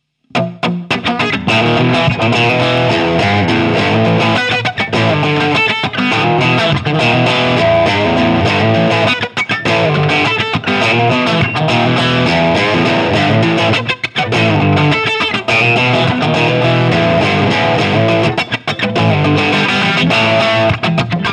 Fender Red Channel

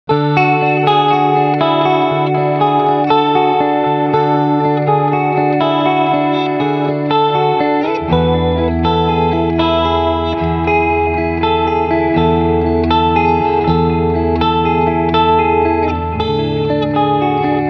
Chorus Delay

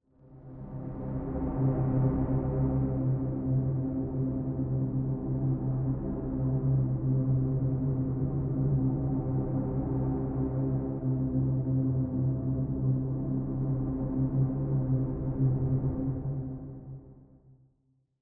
Acoustic Guitars Pad Elements; Scoring

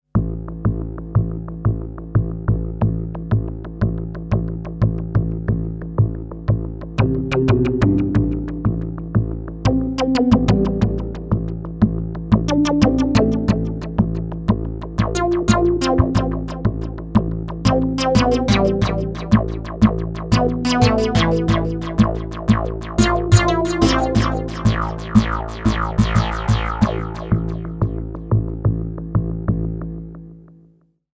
Bass vs Microwave; Fireball; Rob Papen

